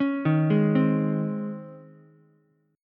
Db Major 7th Suspended 2nd Db Ukulele; DbM7sus2 arpeggiated; JGuitar

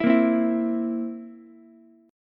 A sharp Suspended 2nd Flat 5th, down, Ukulele; strummed; A#sus2b5; JGuitar

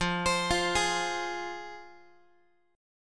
F Minor F down Guitar; arpeggiated; x,13,15,15,12,x; JGuitar

